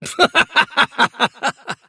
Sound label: wheel laugh; davion; dota